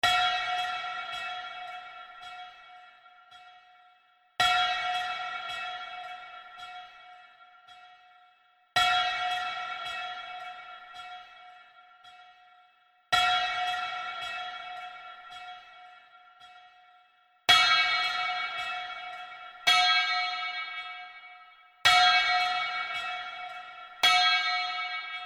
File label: the martian engineers jig